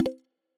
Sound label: Droplet, iOS Sounds